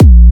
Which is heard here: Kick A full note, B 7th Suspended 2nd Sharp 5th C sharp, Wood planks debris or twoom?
Kick A full note